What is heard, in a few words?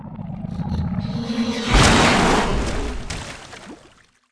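kraken attack; monster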